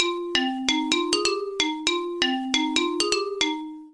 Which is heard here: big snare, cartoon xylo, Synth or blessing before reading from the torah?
cartoon xylo